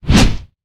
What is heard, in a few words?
Melee Whoosh; Evolve; Goliath